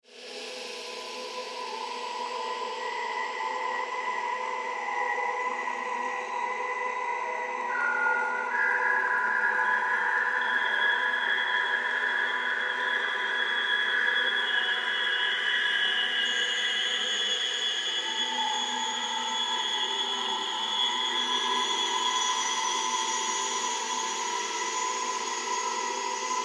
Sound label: Mystical Ambience, Science Fiction; 5.1; Sound Effects:Science Fiction; BlastWave